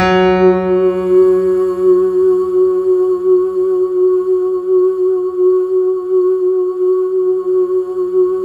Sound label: F#3, Synth Line; 90 s Sample CDs; NiceBeats; SynthLine VOL-22